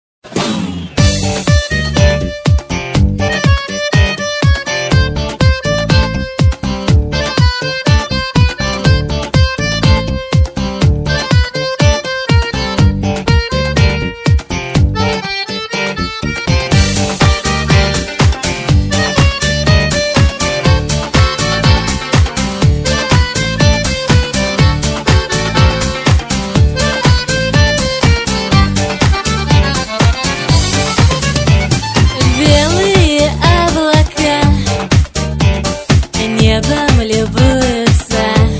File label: accordion; rus